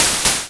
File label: layered Beats